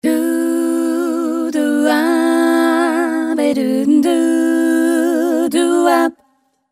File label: Elastic Harmo